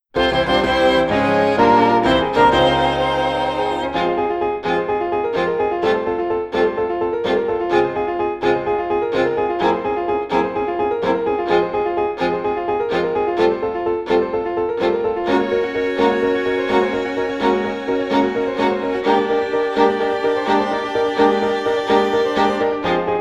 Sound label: Fanfare, violin; FF7